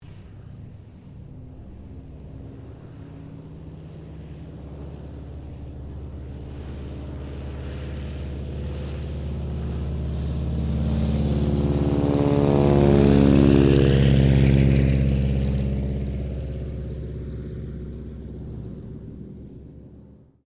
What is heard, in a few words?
AIRCRAFT STINSON RELIANT SR-8C TAKEOFF; 1935 Stinson Reliant; Airplanes; Vehicles